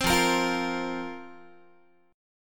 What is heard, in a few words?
F Suspended 4th F, Guitar; Fsus4; strummed; 15,13,13,15,14,x